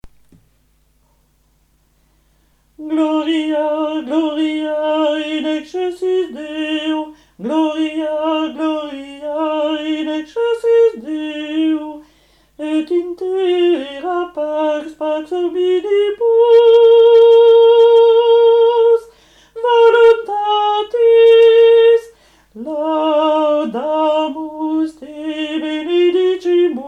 medieval gloria 1ere partie alti

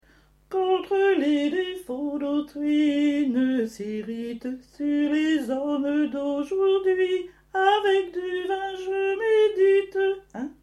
contre les defauts dautrui alti

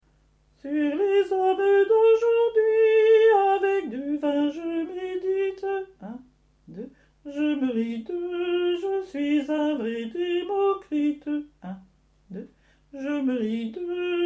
basses

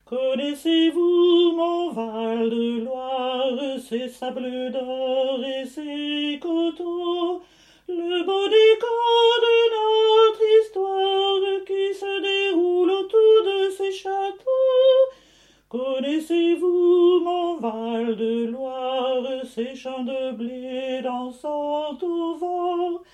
basses

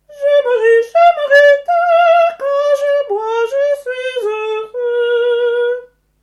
contre les defauts dautrui pour finir tenors

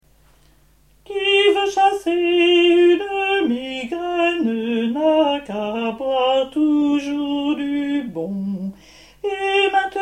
qui veut chasser une migraine basses

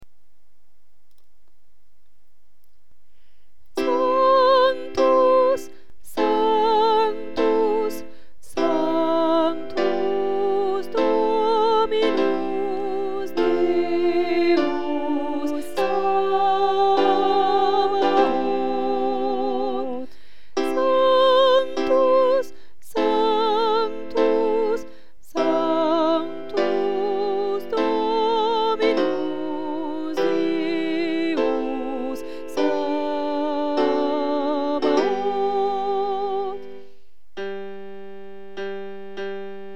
sanctus basse

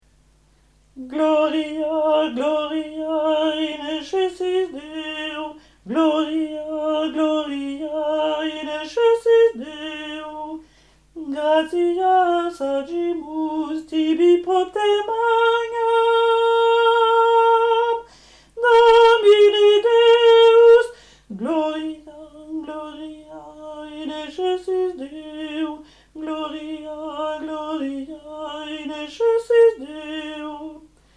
medieval gloria 2 eme partie alti

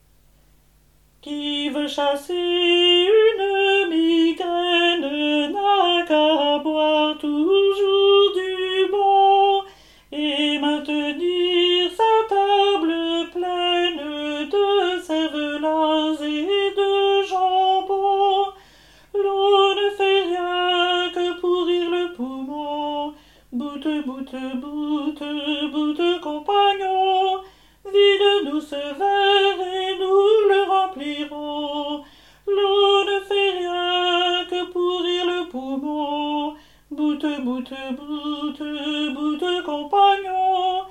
chanson a boire alti

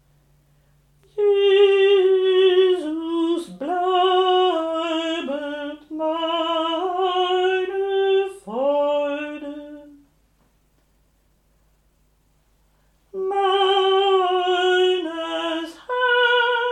basses; jesus bleibet meine freude

